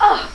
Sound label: bump; quake2; chastitymarks